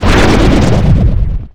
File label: slow blast; SPELLS